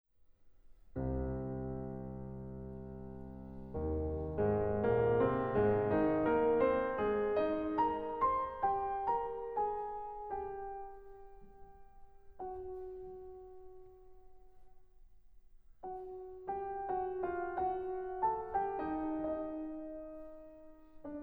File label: Ballade in G minor Op. 23; chopin